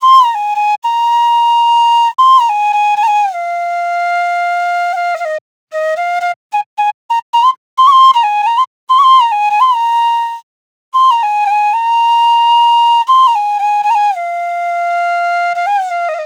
90s Flute Loop 110 BPM A

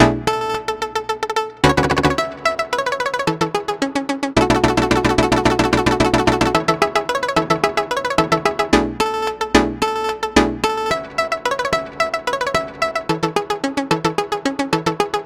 Naughty Melody Loop BPM 110 A Maj; Bihu; waves of bihu vol 2; Gully Loops

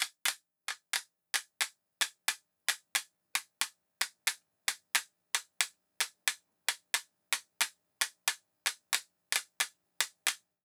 Toka Loop BPM 90; waves of bihu vol 1; Gully Loops

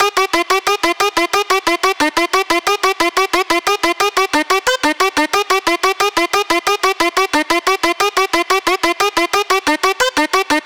Drop Loop BPM 180 F Min; Gully Loops; Rosni; UDD Gaye Sample Pack

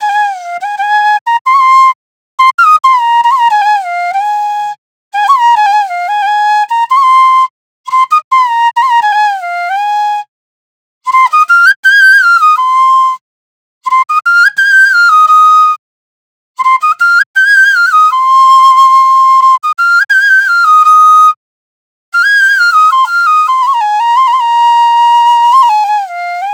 Krisna Flute Loop F

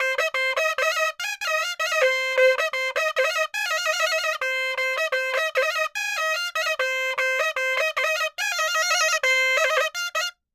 Pepa Loop BPM 100; Gully Loops; Zeng Raw Pepa Sample

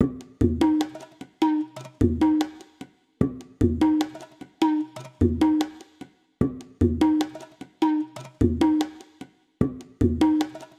Percussion Loop BPM 150; Bihu; Nayak; waves of bihu vol 2; Gully Loops

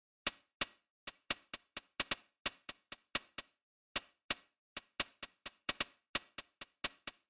Drilla hihat loop BPM 130; Bihu; waves of bihu vol 2; Gully Loops

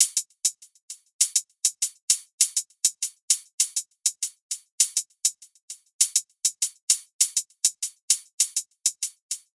Action Hihat Loop BPM 140; Bihu; waves of bihu vol 2; Gully Loops

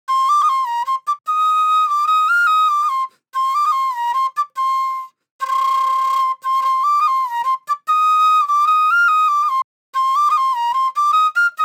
Trap Flute Loop D

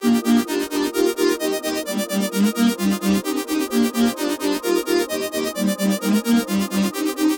Masala Synth Loop BPM 130 D Maj; Gully Loops; UDD Gaye Sample Pack

